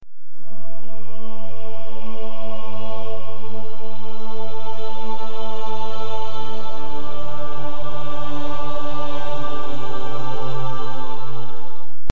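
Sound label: Loop Choir Dm 160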